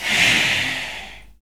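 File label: whisper, utterances; Roland LCDP11 Africa VOL-1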